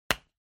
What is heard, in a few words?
Glue Stick (Open)